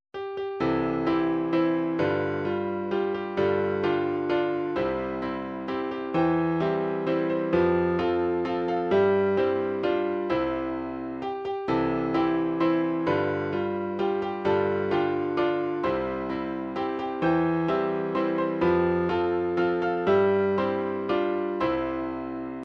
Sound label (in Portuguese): infantil, midi